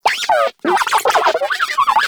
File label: Moa Compassionate Vocal Cold Shiver; Warframe